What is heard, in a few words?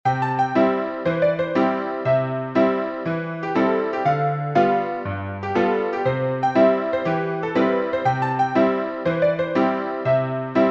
PIANO